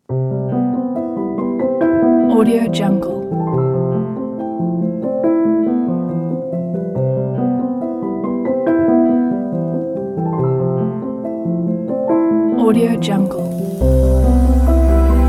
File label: emotional piano; audio-ninja